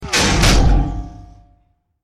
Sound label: ricochet; soundscrate